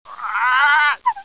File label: squawk; attic